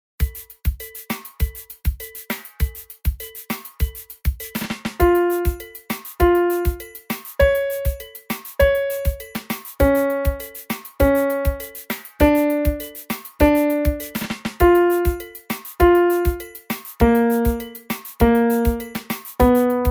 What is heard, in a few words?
random Pitch Practice